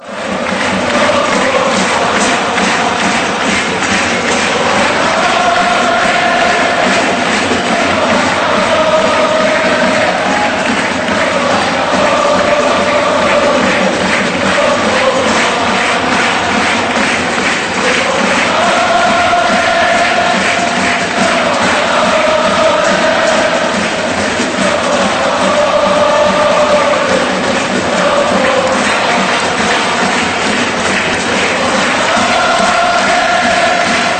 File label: yokohama ole, football chant; yokohama f marinos